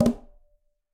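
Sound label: Tumba B Flam Mute; Latin Percussion; Drums; Drum Hits; Conga; Ableton; Factory Packs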